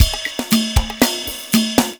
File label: LATIN, 118, Dance Trax loops